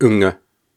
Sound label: Syllables and Imaginary Words, Viking Vocal, Hard